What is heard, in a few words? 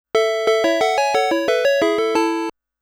Challenging Stage Start MUSIC; Namco Classic Collection Vol.2; arcade